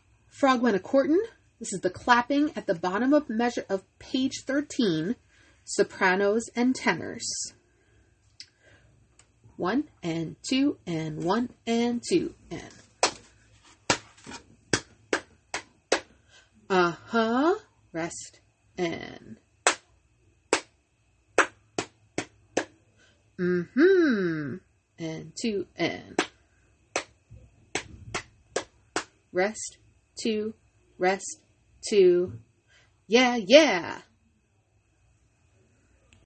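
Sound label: frog courtin soprano tenor; study tracks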